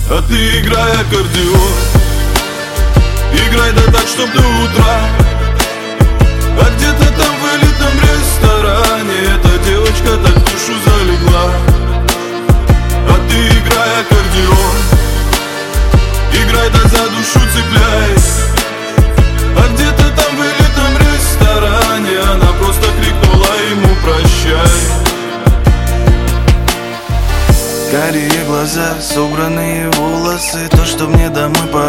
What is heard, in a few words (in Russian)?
Играй аккордеон